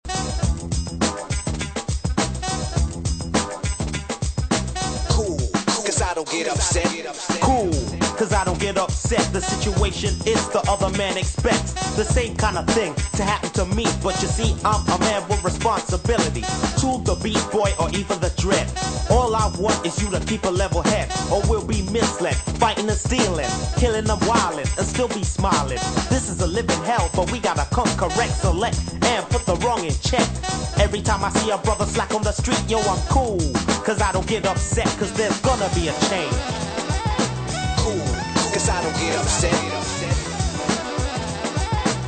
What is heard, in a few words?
intro rap refrain